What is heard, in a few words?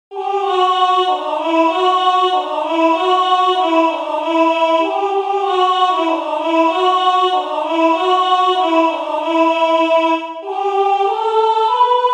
Kalinka alto, chorale